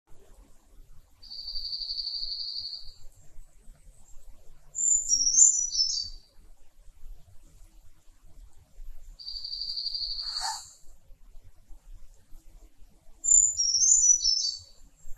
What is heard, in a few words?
brown creeper